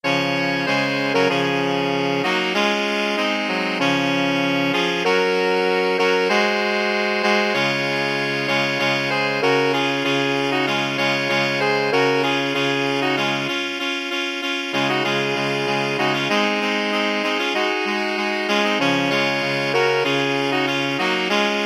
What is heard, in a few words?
alto sax quartet